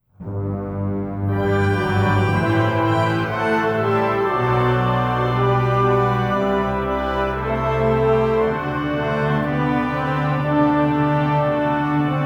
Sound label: Fantasia in G Major; BWV 572